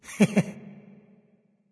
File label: "heh heh"; smite; voicepack; ullr